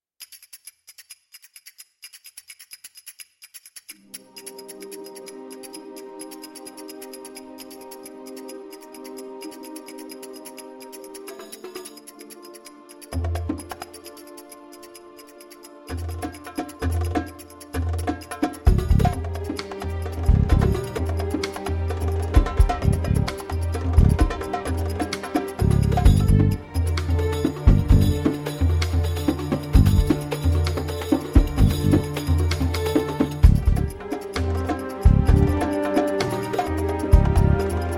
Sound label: Drums; Sonokinetic; Sultan Drums